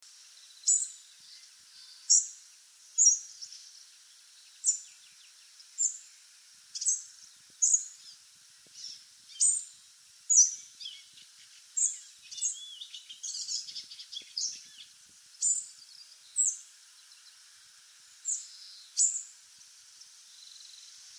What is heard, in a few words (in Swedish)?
Grå flug